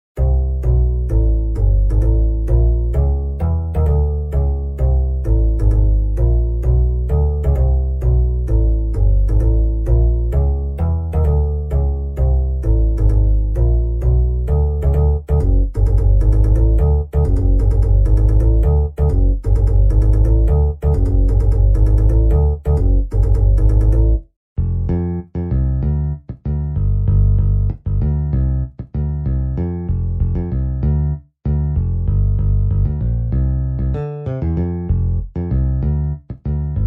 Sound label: Guitar Bass